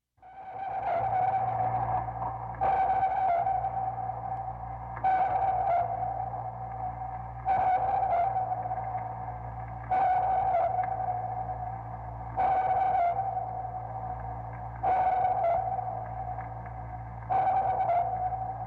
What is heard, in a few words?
Sonar; This sound effect was recorded in a custom built sound booth